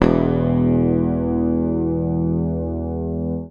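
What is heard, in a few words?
bass, E1, left channel; PICK; Sampleheads; Will Lee Bass Library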